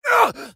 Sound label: grunt